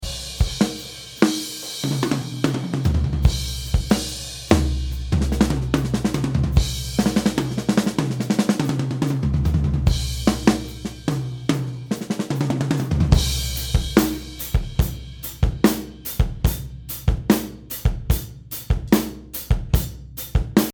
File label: Drum Sample